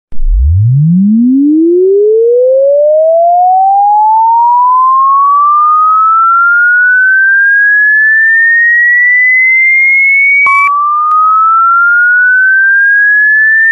beep ringtone instrument mobile call ringtone new background music